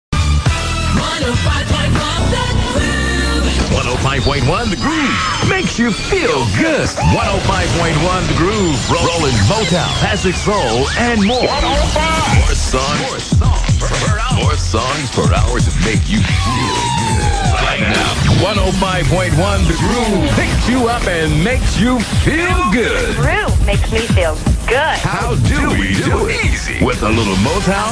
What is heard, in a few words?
Jingles; WGRV FM 105.1 The Groove; 2000